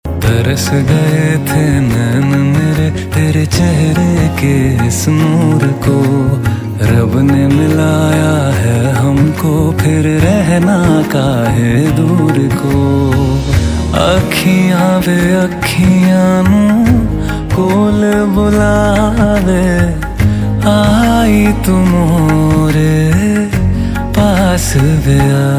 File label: hindi ringtone